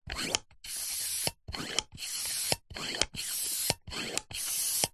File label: plastic hand pump pumping pump end; zapsplat